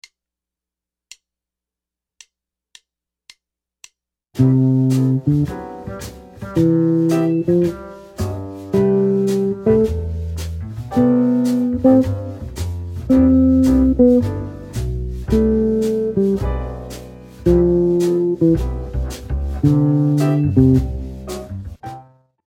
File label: Scale Rhythms, guitar